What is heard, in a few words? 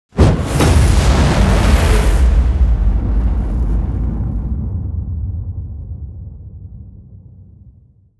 fire spell with explosion; mixkit